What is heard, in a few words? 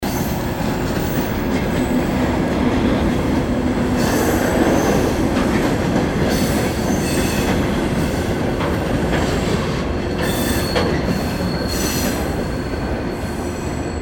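sound of railway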